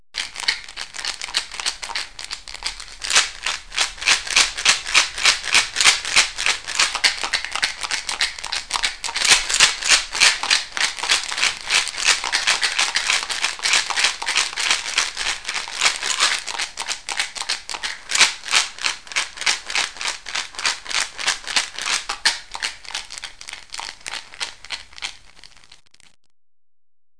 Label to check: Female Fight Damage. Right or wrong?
Wrong